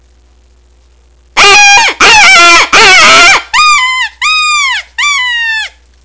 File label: pup whine